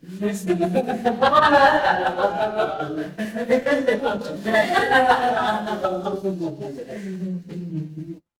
crowd laughter

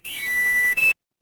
ui power on

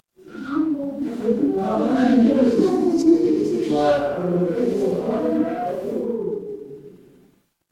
crowd murmur

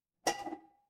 glass ceramic

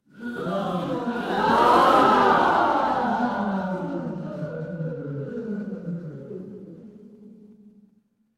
crowd gasp

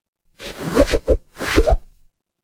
transition swoosh

